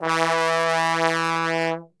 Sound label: E2 BASS TRB, Brass; Best Service; Brass Super Section